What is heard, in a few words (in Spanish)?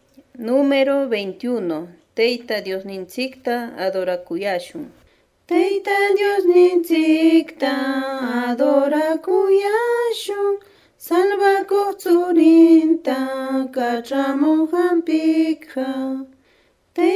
cancion